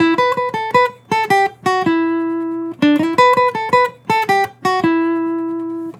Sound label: Acoustic Melody Loop - 160 BPM E Min; Cymatics